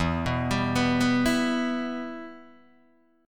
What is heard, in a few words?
E-Minor, Guitar, Open D; 2,2,5,5,2,2